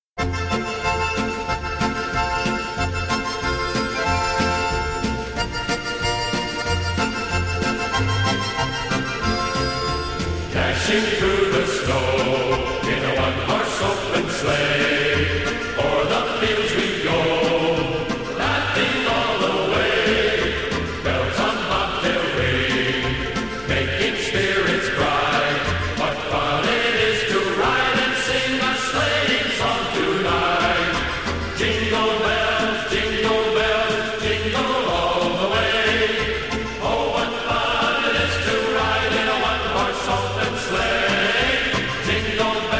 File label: Jingle Bells